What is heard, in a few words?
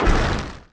asteroid collision metallic small; Factorio